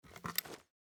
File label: rotor; tacz; daffas arsenal